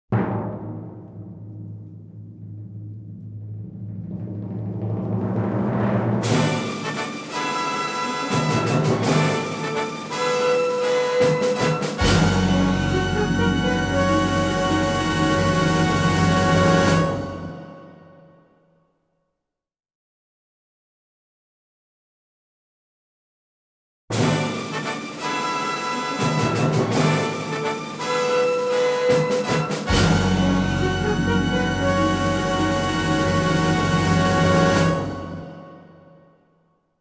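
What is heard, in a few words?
Main Fanfare - Instrumental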